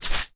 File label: seasoning large pot shake single